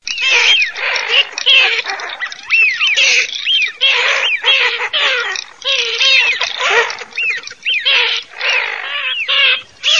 fischione marzaiola, birdsound

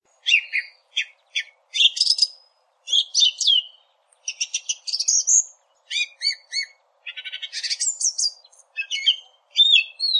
Tordo bottaccio

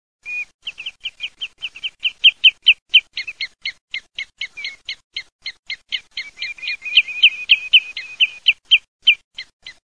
PETTEGOLA, birdsound

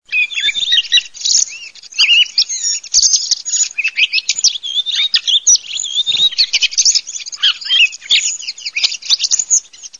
TORDO SASSELLO; SASSELLO S5

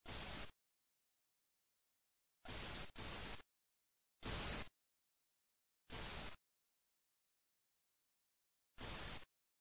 ZIRLO

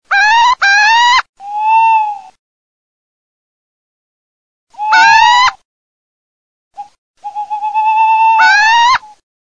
ALLOCCO CIVETTA

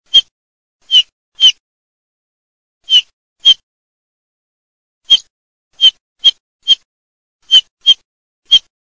CIUFFOLOTTO, birdsound

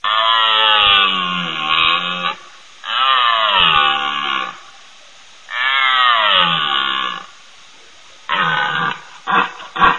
CERVO

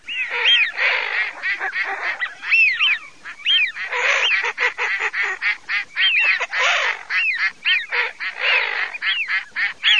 GERMANE FISCHIONE

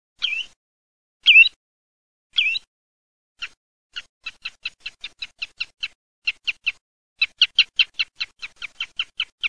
TOTANO

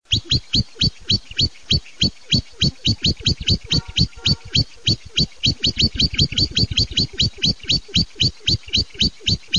spincione

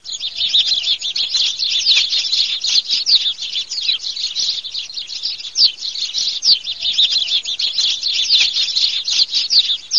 PASSERI PASSERI, birdsound

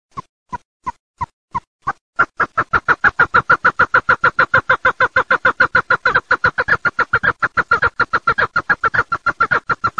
PERNICE

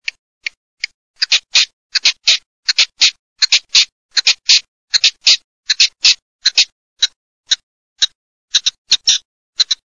PERNICE ROSSA

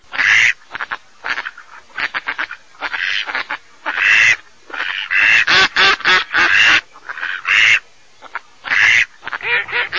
germano, birdsound

